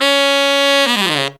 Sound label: TENOR FX, Horns; Zero-G; Phantom Horns